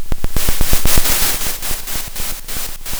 Sound of a drum roll

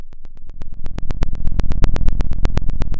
Sound of a fire crackling

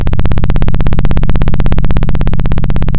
Sound of a plane taking off

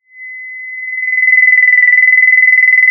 pop, Sound of a phone ringing

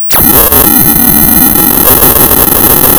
pop, tearing paper

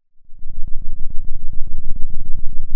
Sound of a thunderstorm, center

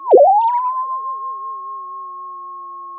Sound of a bird tweeting nicely

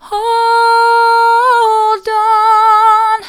hold on mid, vocal

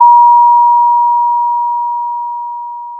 Sound of a school bell

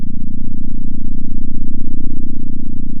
pop, sound of a small motorcycle

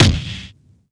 Vinyl Kick, drum

